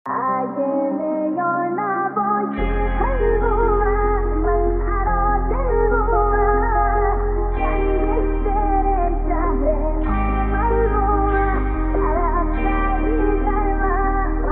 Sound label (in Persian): Sedaye Bache